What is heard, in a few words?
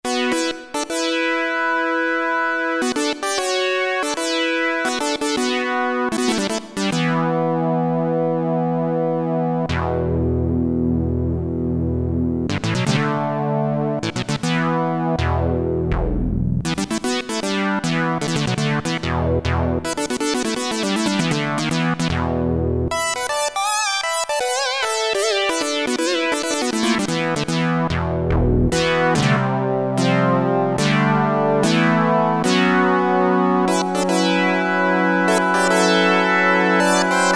FAT FIFTHS, Roland Jupiter-8; Factory patches